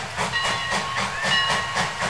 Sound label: bell, railfan